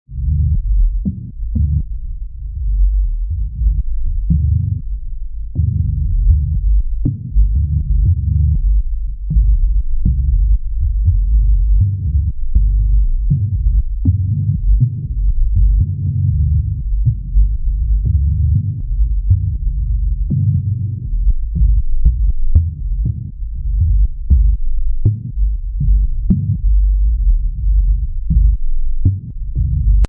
Bassmnt Bass, Deep and creepy evil bass sound; LOW FREQUENCIES; Bassment Bass; Deep Tones; Sound Design

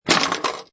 Pinball Sound Ball Rattling Objects

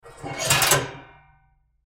Stove Small Drawer Open Antique; Historical; Appliance; Household; Blastwave

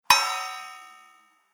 Clang; Impact / Explosion Sound Effect

